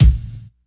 long kick single shot b key

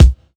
bumpin kick drum sound c key

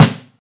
crunchy acoustic snare d sharp key; snare drum samples

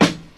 boom snare one shot e key

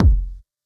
Huge Kick, Fm; drum single hits one shots; kick drum samples

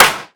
dry snare drum A key; snare-drum-samples

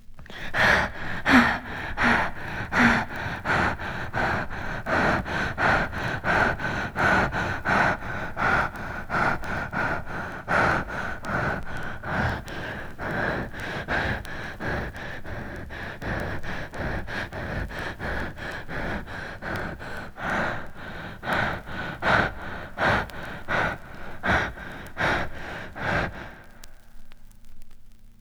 heavy breathing - femaile - horror; Equipment used: TTA05USB akai player and focusrite 8i8 interface, using an SSL limiter; Recorded from Sound Effects - Death and Horror rare BBC records and tapes

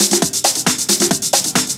alert techno top loop; drum loops

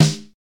old school tight steel snare drum sample D# key

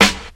prominent snare drum sample d sharp key

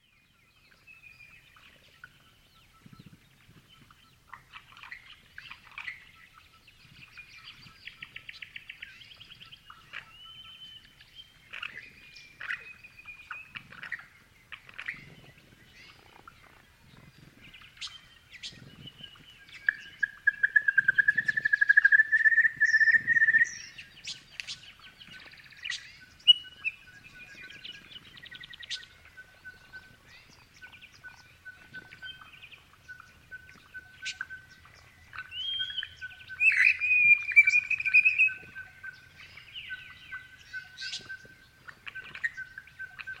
honeyeater birds, crested bellbirds sounds; soundscapes

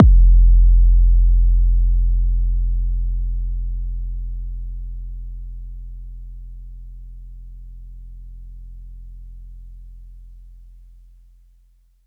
phonk kicks oneshot 808 F; kick drum

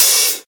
tamed open hat sample f sharp key

